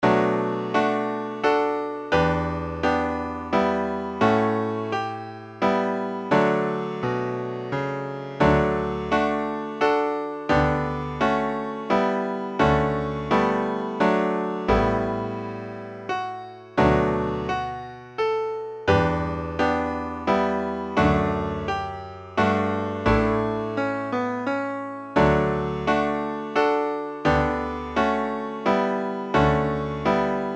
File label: Willow Waltz